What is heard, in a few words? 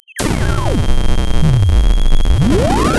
pop